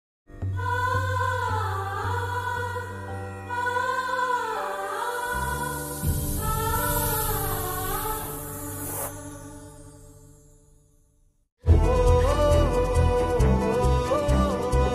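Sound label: alone love ringtone mood off romantic ringtone heart touching ringtone telugu south ringtone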